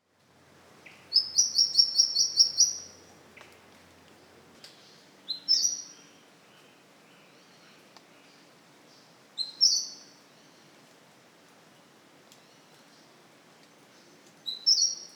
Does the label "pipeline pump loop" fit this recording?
No